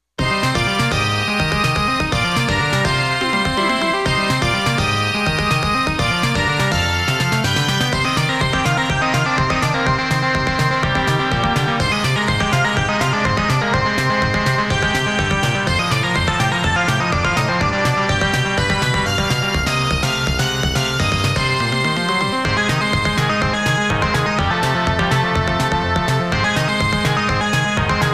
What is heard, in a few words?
BGM